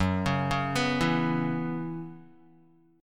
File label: Gb 7th Suspended 4th Gb down Guitar, chord; Gb7sus4; arpeggiated; JGuitar